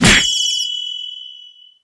skhit stun

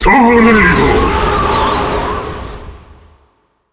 skill tornado enemy

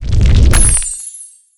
stage open

